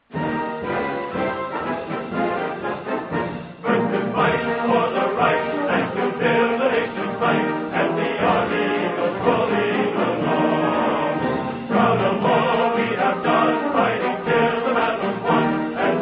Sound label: Army Song Band And Chorus